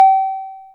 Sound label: bleep, Korg Electribe ER-1; Kit 8